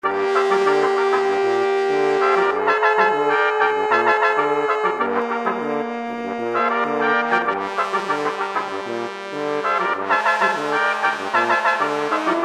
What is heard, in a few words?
Trumpet Song